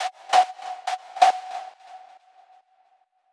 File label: Whistle 138 BPM, Sound FX; Bminor